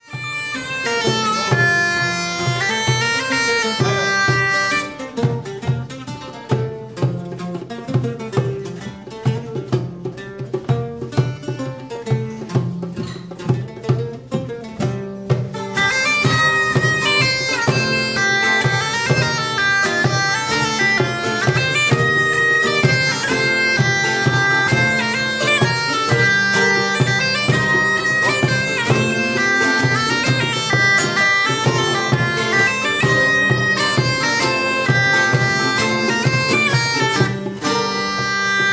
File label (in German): mazurka